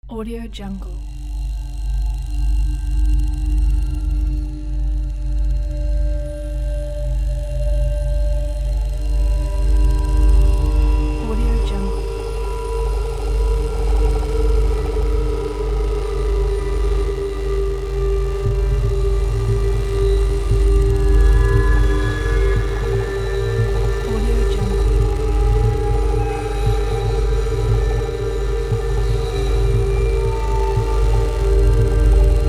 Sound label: magical texture sci-fi